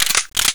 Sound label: stickylauncher reload; chicken fortress 2